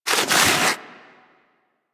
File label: Armadillo Roll Snow; Warframe; Hunting Critters; Foley